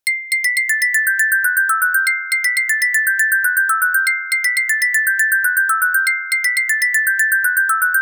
creepy theme

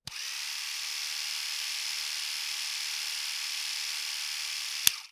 electric razor

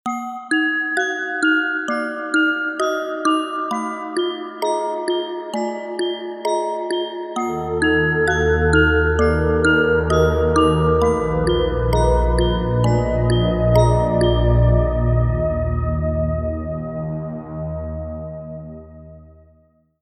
Music Box Game Over

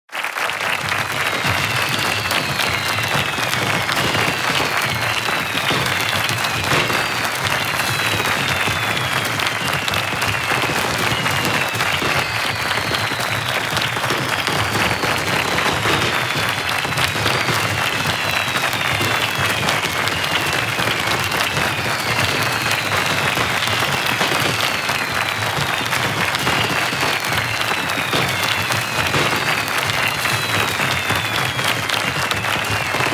applause fireworks

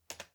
keyboard key press; keyboard typing

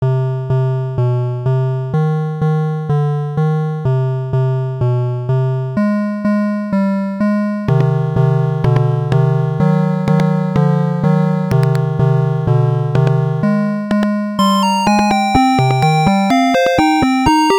8bit melody

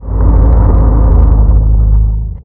engine

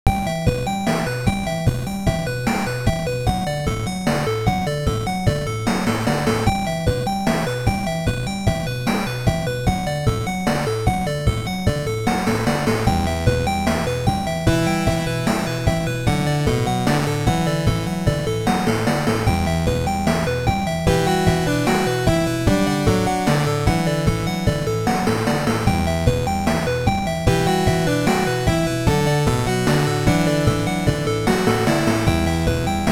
SLOW Dark Music

